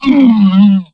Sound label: hurt